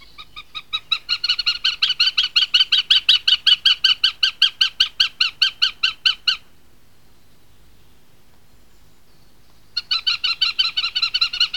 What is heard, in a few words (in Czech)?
jestrab